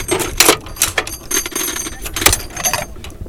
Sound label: Vehicle reloads; Red Orchestra 41-45